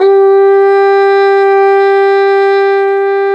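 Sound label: brass plunger trumpet, plunger mute; Plunger & Wa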